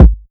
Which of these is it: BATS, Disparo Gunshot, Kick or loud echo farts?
Kick